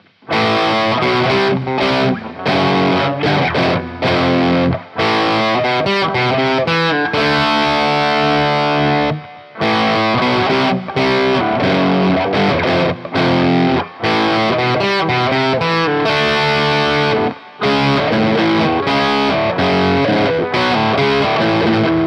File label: Lick; petrucci